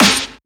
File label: Snare; FLPRO